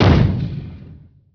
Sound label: explosion small; Emperor - Battle for Dune